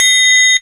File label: BLEEP; Zero-G; Funky Elements